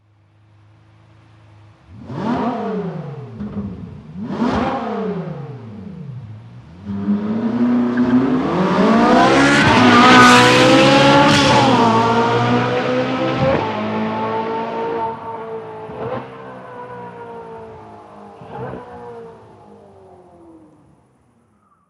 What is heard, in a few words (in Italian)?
partenza